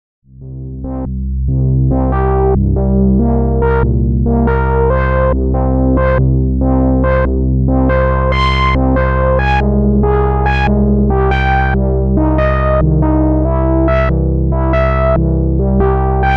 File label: waldorf pulse